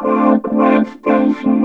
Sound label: Korg, FX; Back In Time Records; Korg Universe VOL-1; FX FAMILY